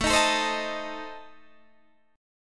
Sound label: A Diminished A down Guitar Open D